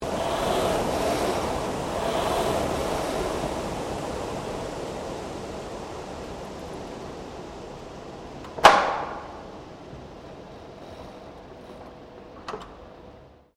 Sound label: GYM ROWING MACHINE STOP; Various gym machines; Equipment Sound Effects; Equipment (Sound Effects:Sports)